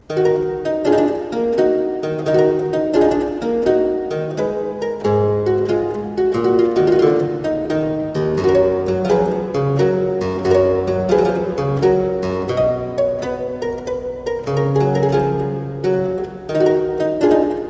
Suite in E Minor - Sarabrand, spoken, Keyboard Music; William Croft